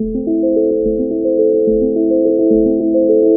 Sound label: keys, Sad Loops